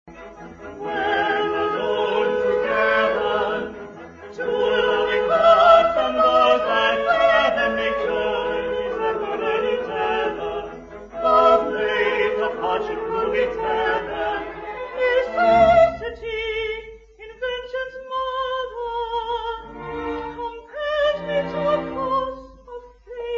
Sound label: singing